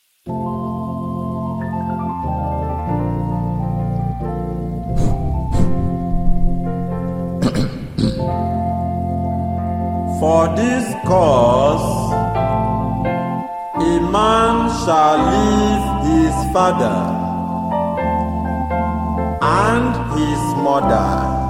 Choir

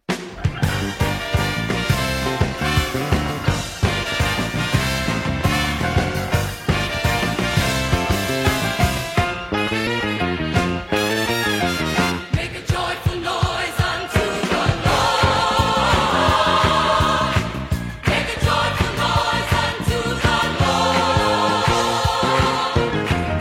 Choir

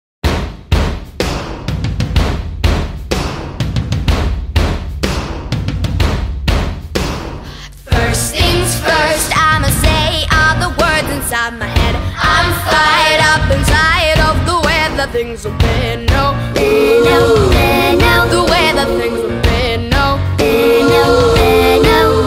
Children s Choir

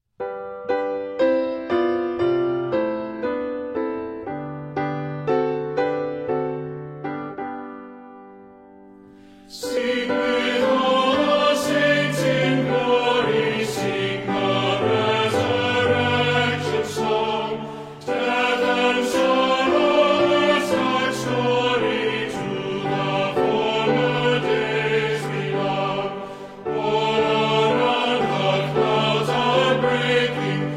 Christian Hymn; Sing With All The Saints In Glory

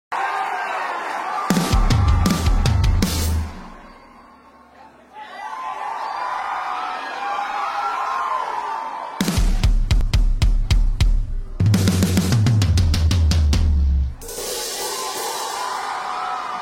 Drum Solo

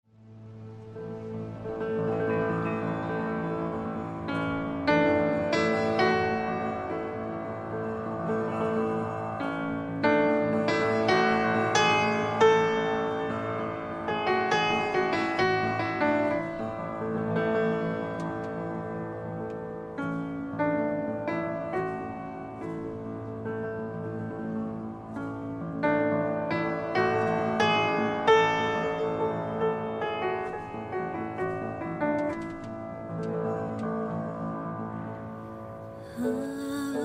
Easter Choir, Agnus Dei; Ukrainian

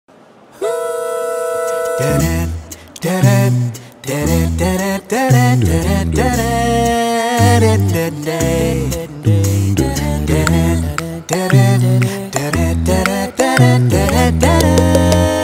acapella

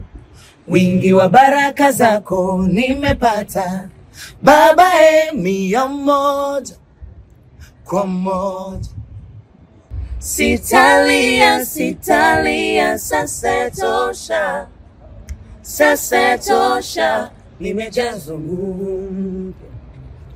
Gospel Choir, Acapella

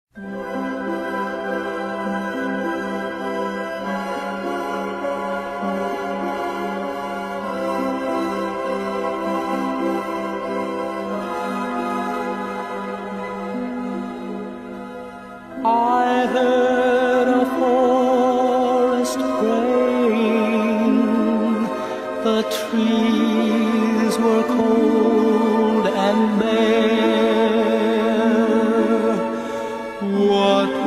Christian Hymn

